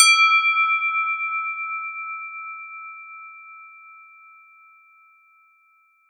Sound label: synth bell; dir res EQ